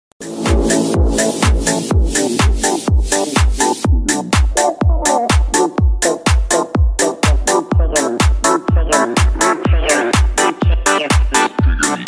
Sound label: electro